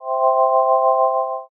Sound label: magic circle